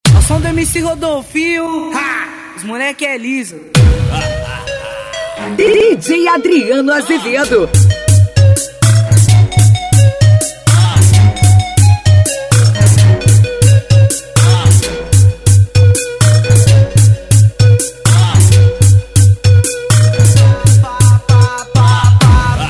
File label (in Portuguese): funk bass o poder do grave